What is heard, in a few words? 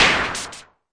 mbrute noiz, Arturia MiniBrute; DRUM MACHINES